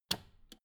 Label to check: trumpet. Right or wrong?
Wrong